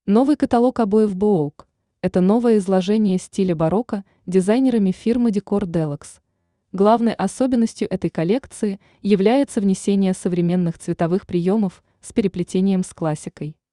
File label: baroque